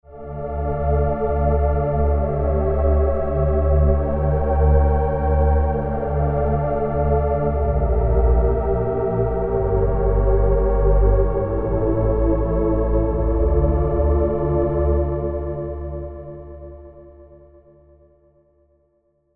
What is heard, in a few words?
pad